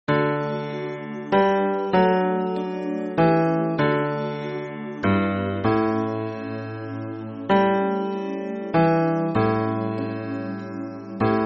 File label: hymn bass